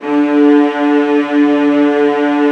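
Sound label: VIOLAS E3, Strings; ILIO; Synclavier Strings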